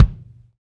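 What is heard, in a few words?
kick, Roland; Club-50 - Foundations Roland; Ext. Snare 4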